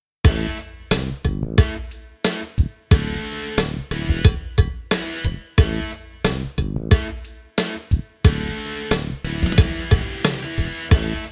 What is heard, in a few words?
Rock active mixed; Gyraf GXXIV